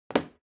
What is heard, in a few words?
Sensu Put Tatami